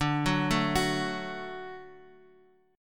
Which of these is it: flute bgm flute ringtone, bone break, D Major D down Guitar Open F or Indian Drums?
D Major D down Guitar Open F